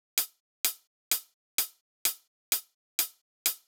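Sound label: Drum Loop 128BPM closed hi-hat; VEC5; Drumloops; Multi Loops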